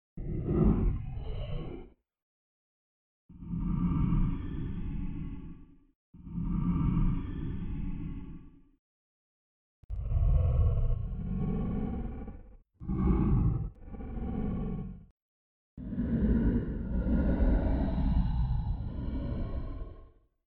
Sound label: Ghostmonster sounds